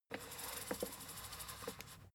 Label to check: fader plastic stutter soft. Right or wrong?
Right